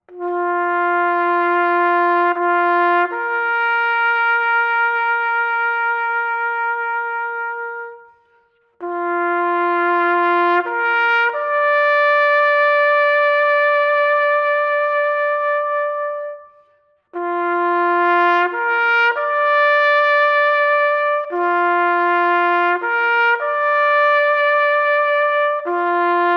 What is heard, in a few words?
Taps